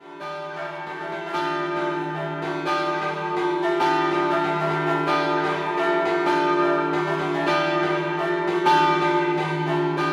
Cella Scampanottata, campane

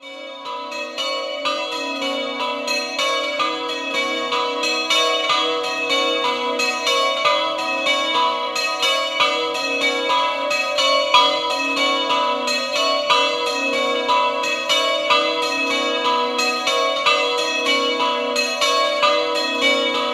Camolli, campane

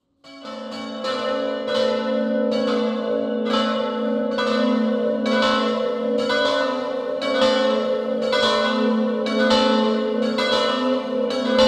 Campane Dordolla Moggio Udinese

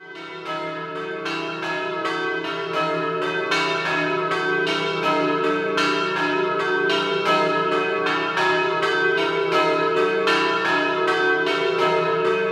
Rive D Arcano Doppio, campane

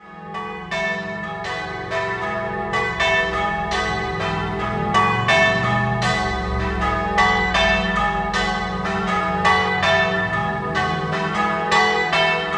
Tramonti di Sotto, campane

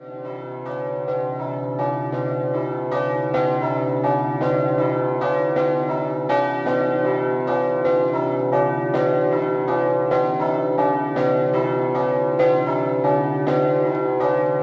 ERBEZZO, campane

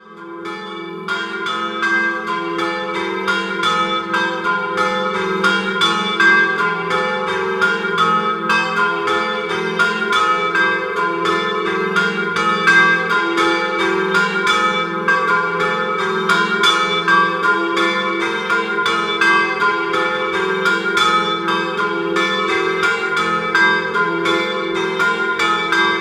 Solimbergo, campane

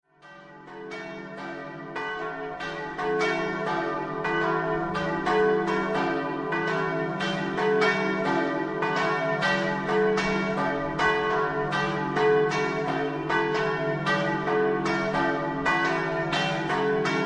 PAULARO, campane

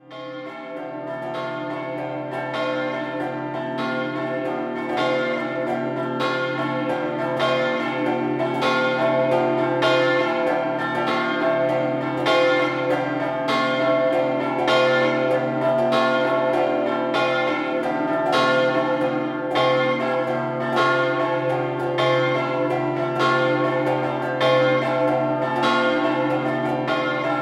ATTIMIS, campane

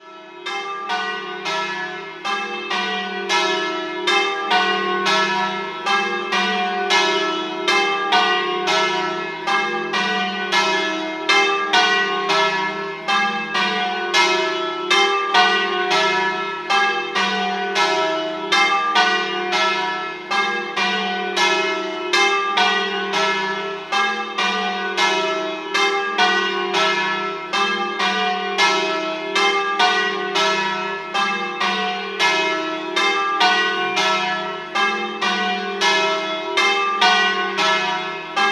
Ghirano, campane

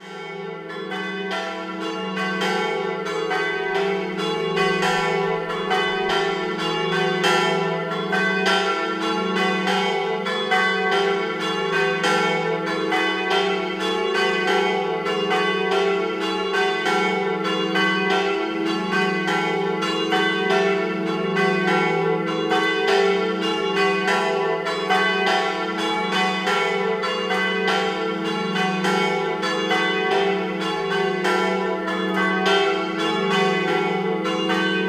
Vigna, campane

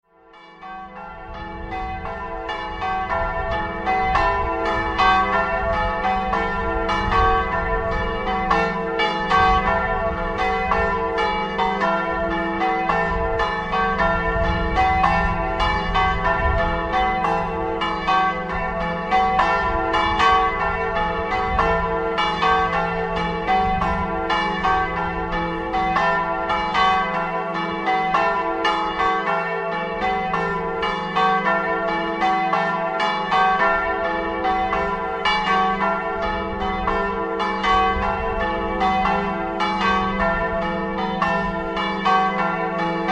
SUTRIO, campane

